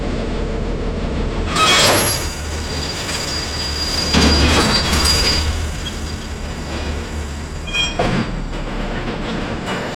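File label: 40 foot steel shipping container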